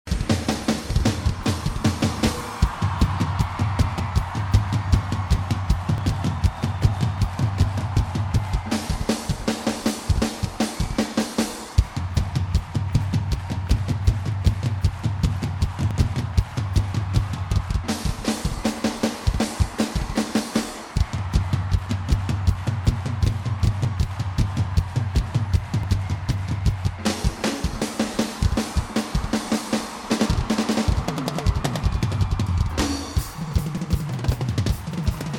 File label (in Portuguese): Dueto de Baterias